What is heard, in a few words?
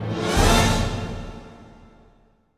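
A aug5, orchestra hits; Northstar - New Gold VOL-2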